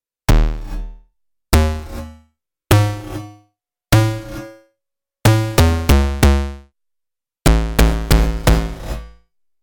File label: Early Bass; KOPRO+